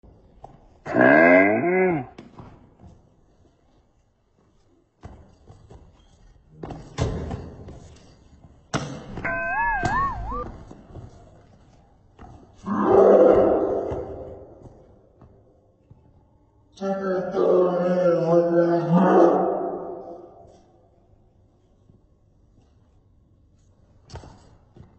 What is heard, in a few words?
laugh; breadradio